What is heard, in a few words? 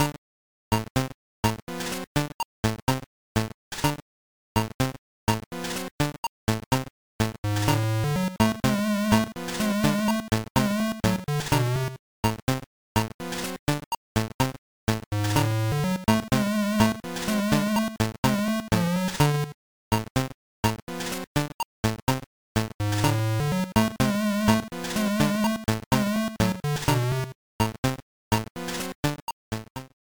Music Stage 2; Stage 2 (Virtual Boy Wario Land)